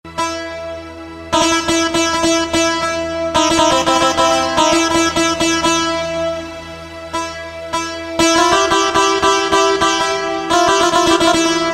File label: ashig saz; انواع صدا برای ارگ